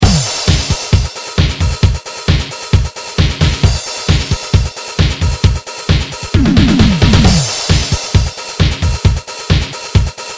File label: BEAT Trance; Rhythm Core Alpha 2